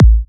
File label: Kick; Ultimate Kick Collection